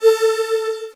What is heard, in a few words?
Flash Beep Long; Multimedia Internet CD-Rom Flash